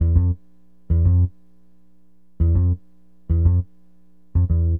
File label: BASS, Acid Jazz Funk